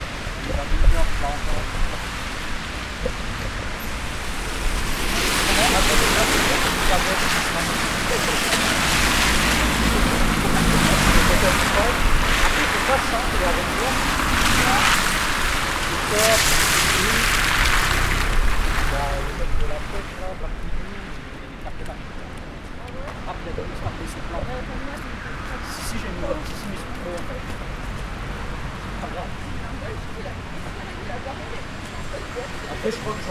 pluie, place leclerc

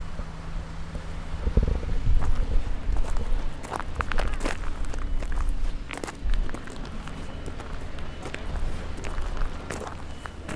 travaux gravier; campus universitaire de la bouloie

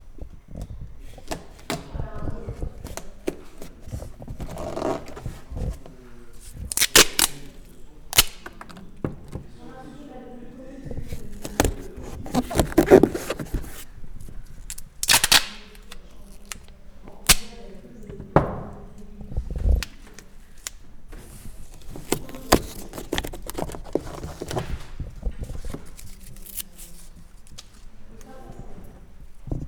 Scotch, asmr

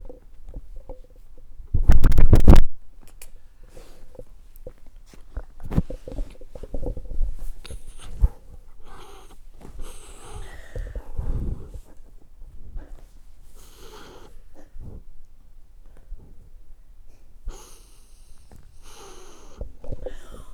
le chien; appartement

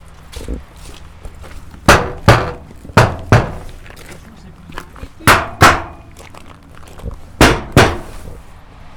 metal, campus universitaire; campus universitaire de la bouloie

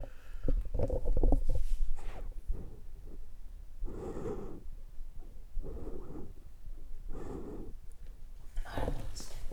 le chat; appartement

